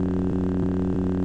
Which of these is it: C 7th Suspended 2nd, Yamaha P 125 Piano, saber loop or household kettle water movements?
saber loop